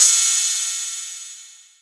Crash Cymbal Drum Sound G# Key, Royality free crash cymbal sample tuned to the G# note.